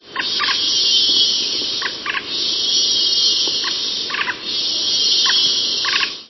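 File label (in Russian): равномерное стрекотание дятла в дикой природе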